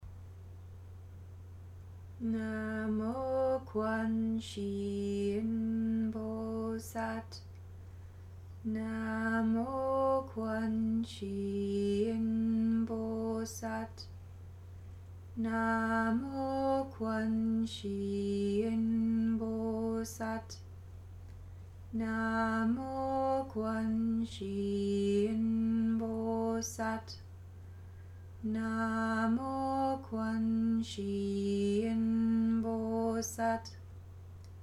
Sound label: Chant; Namo Quan Shi Yin Bosat